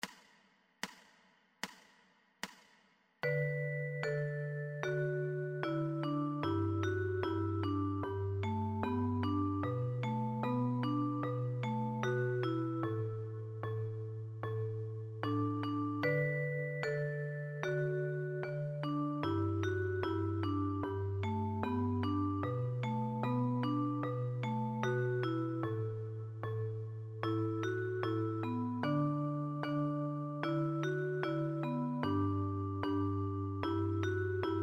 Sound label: Shotgun Boomwhackers 75